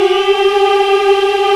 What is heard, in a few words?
VOX African; Roland LCDP11 Africa VOL-1